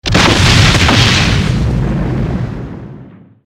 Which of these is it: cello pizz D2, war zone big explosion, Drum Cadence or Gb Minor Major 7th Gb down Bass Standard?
war zone big explosion